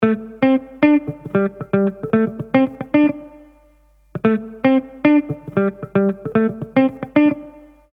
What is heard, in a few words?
guitar loop; waves